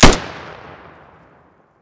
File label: m16a1 single